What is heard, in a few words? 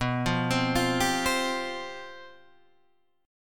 C Suspended 4th B; Csus/B arpeggiated; JGuitar